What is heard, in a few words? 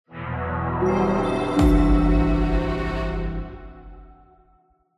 UI Quest Fail; bellatia